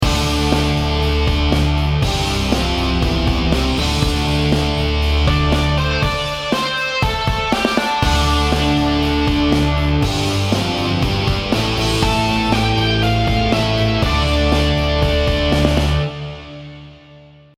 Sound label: theme song